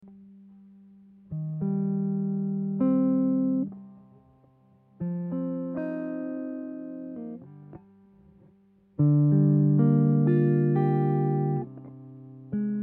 C 120 Elec GTR solo chords

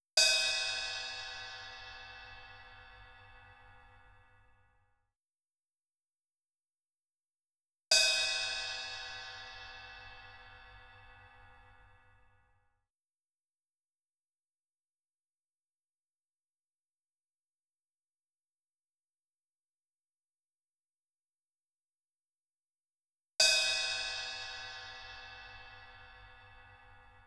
drums crash cymbal

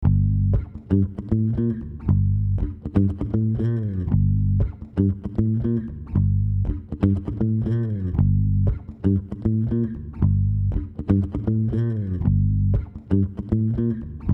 Funky bassline with limited reverb; Deeplastik

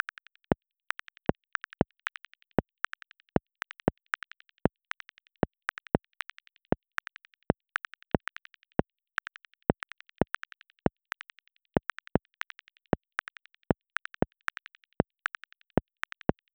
drums

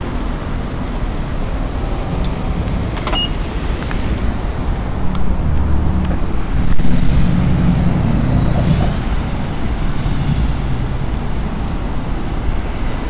roadway traffic

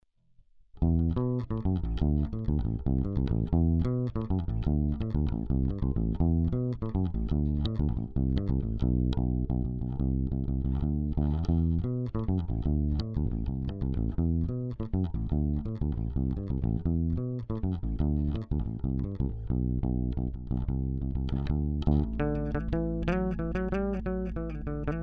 Bass Phrases

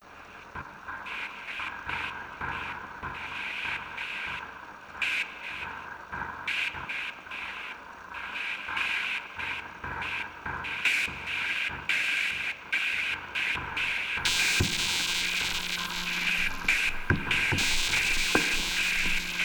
Zone Ambience

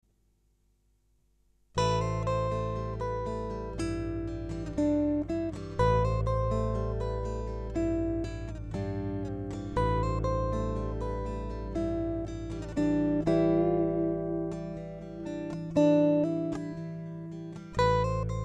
AGEGAE 120 GTR arpeggios

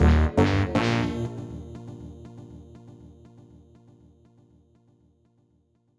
bumper music; MixterPlus

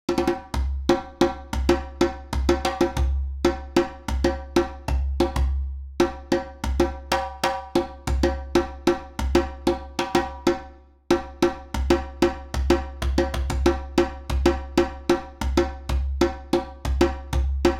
reggae

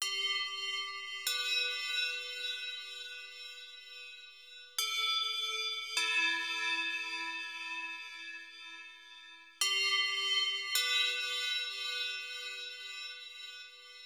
bell 100bpm C

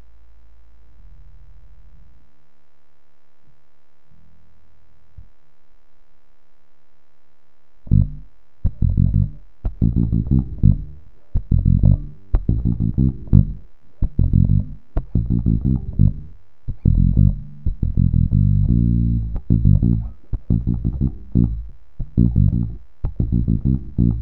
G min Funky Riff

